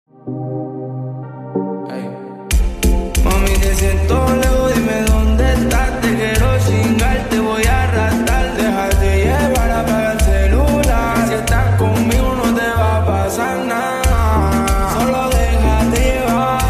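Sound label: Spanish Ringtone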